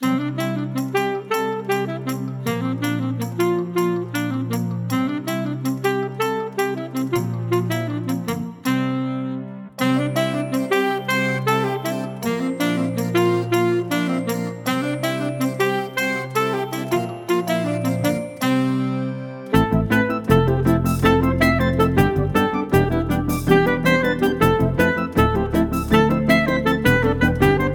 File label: jig